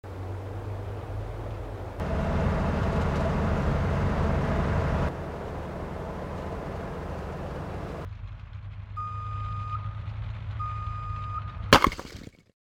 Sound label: Wrecking ball scene